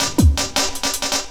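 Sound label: LOOP snare drum, Drum & Bass, 160 BPM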